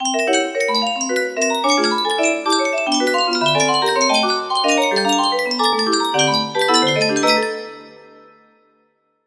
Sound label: musicbox